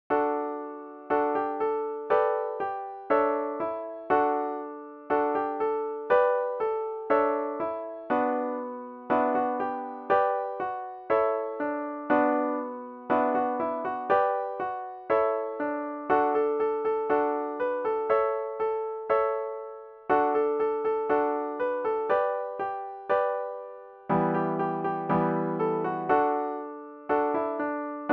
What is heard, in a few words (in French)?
chanson sur instrument avec mélodie en Ré